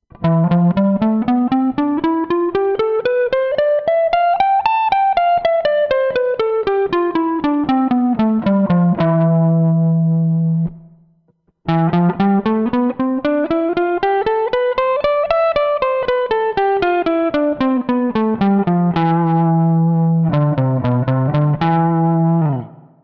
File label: shred